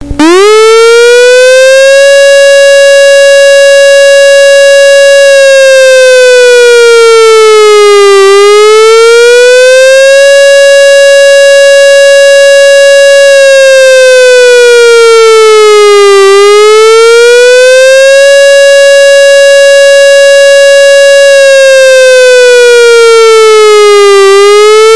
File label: siren; 민방공경보; 민방위경보신호방법; 사이렌 장비